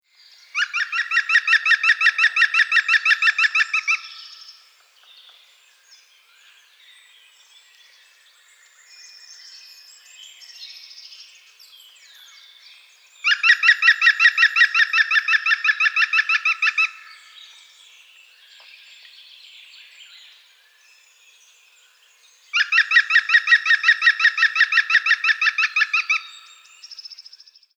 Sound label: Picus viridis